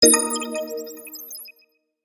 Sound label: Space message; Nokia N79; Audio themes